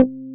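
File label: Pluck Bass; Best Service Gigapack 2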